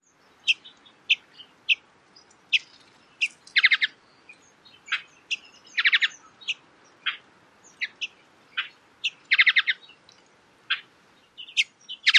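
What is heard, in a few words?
calls, Western Bluebird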